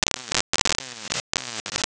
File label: approx error signal